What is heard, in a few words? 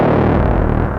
d sharp m - 145, synth; monodelay; synth loops